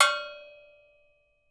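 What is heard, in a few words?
deep cow, right, Percussion; Miroslav Vitous - Percussion & Harp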